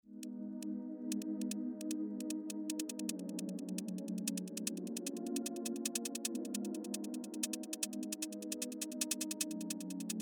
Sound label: Jam3 Tom Cat